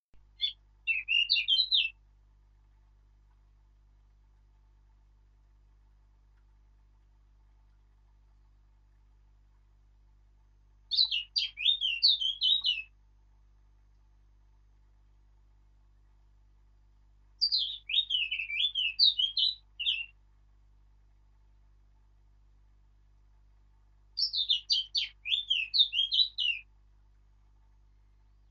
Sylvia atricapilla

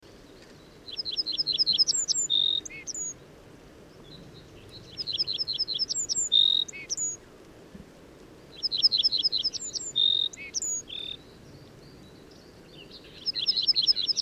EmbePusi song

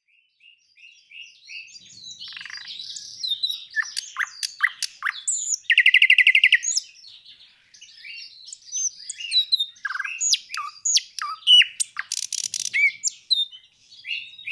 Luscinia, birdsong

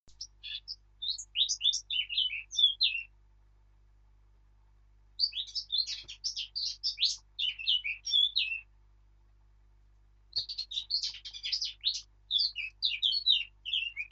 Sylvia atricapilla

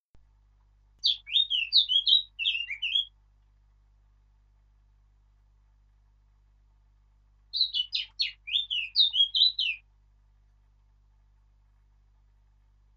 Sylvia atricapilla

